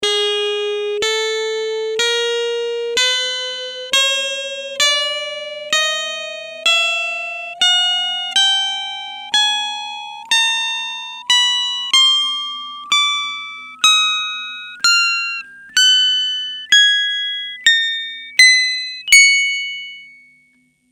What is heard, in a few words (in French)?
Echelle 22 tons corde Meteor + Sinus